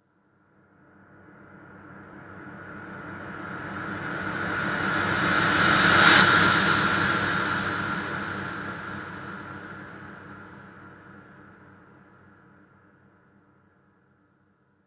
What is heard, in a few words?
breathy sweep; Ze Franks Pain Pack; sweeps and fx